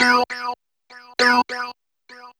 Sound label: SYNT, funk